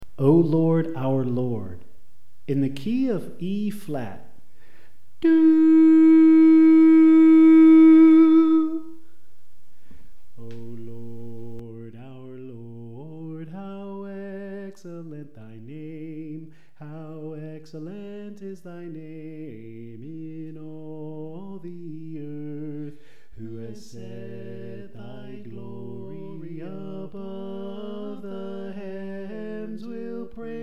Alto

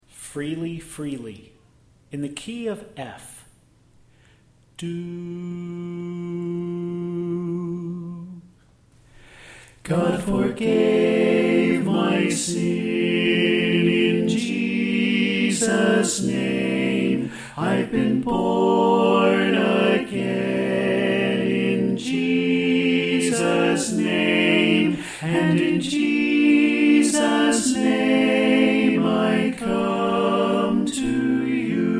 Tenor Left

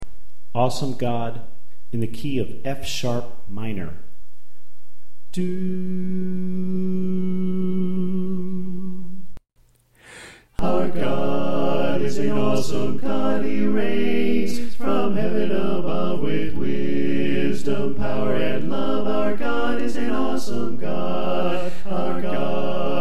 Soprano Left

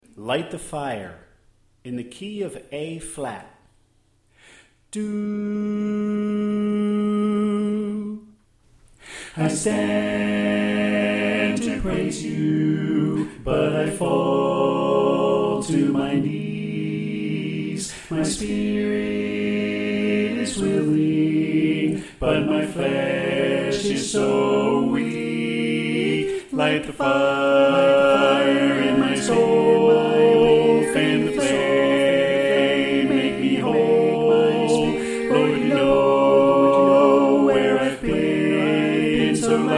Tenor Left

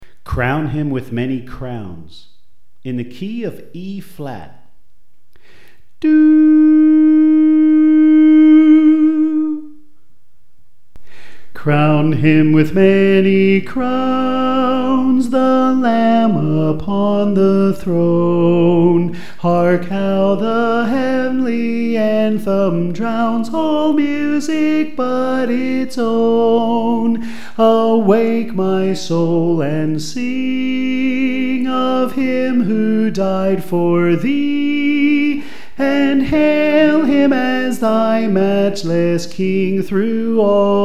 Soprano

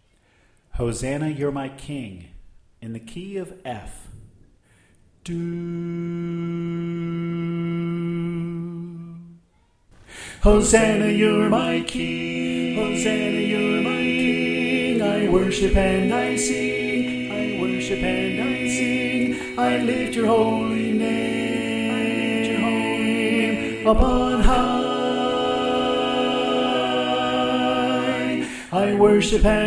Soprano Left

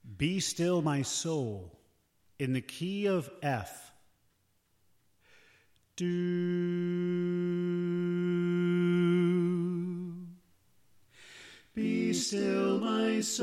Alto Left; Be Still My Soul

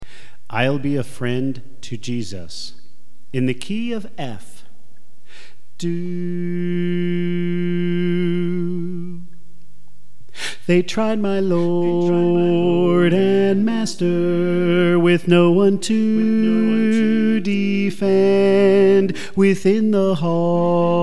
Soprano

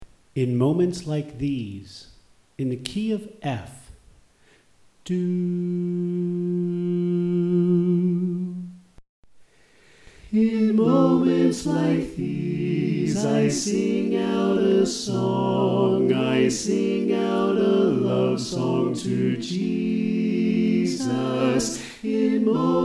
Tenor Left

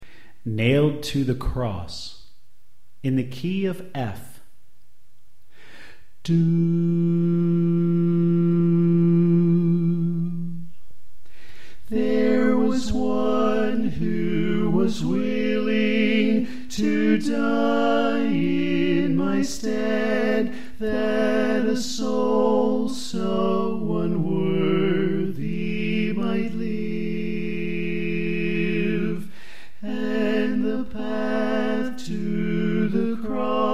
Bass Left

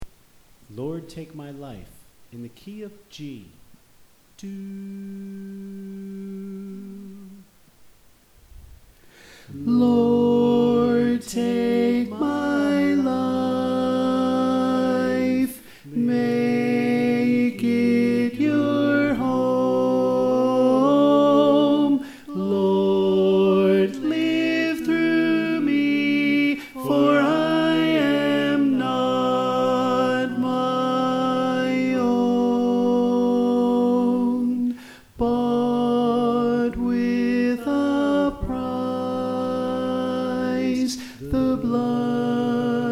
Tenor

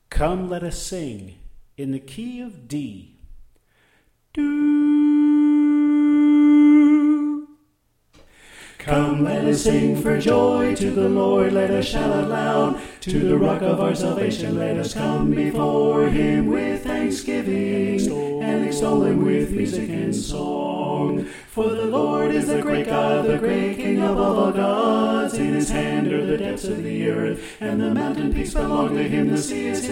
Alto Left

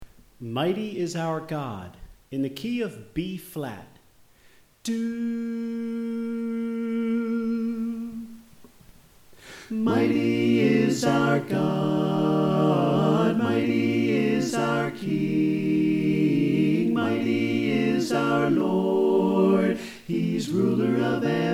Tenor Left

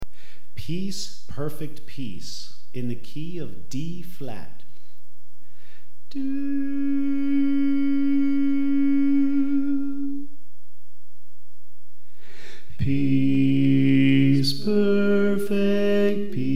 Bass

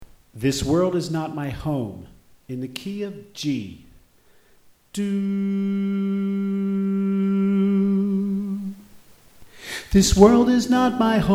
Soprano

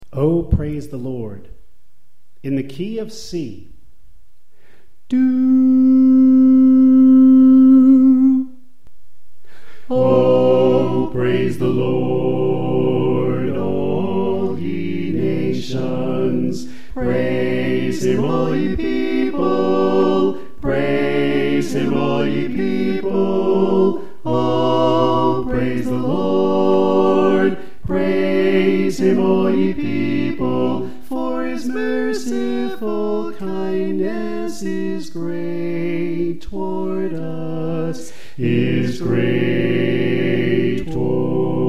Soprano Left

